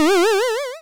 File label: balloon